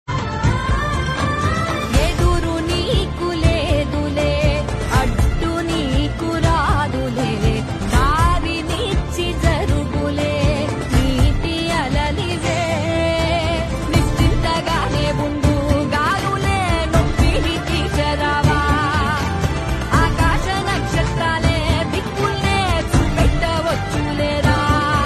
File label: Ringtone